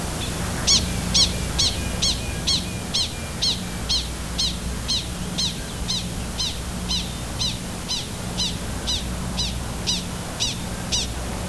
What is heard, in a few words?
coyote chirps